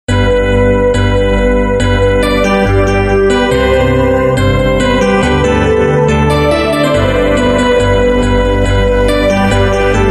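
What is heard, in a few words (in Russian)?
jingle bells техно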